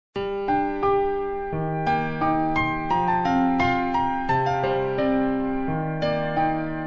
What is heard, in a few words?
beautiful japanese sms; ringtone